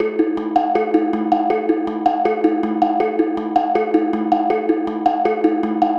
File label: Metal Tank Tongue Drum, processed; Sonic Foundry (Sony Creative Software)